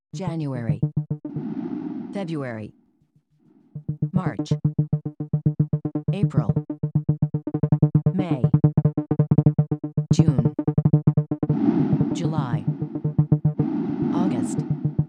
C02 Flux Combined Mix equally spaced time no beeps